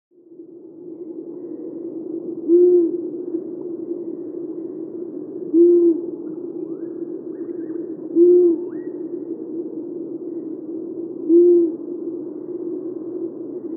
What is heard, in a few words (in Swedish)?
hougg